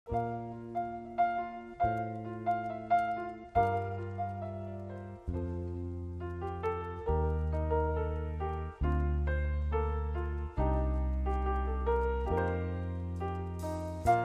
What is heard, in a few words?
No Flutes & Violin